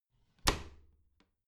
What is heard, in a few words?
bathroom cabinet door creak close regular